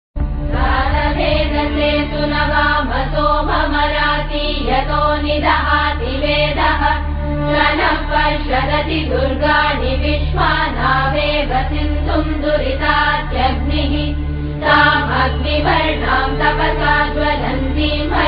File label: shri durga sooktam; Navratri Special